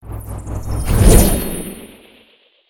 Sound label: Pet Spawn Stereo; creatures pets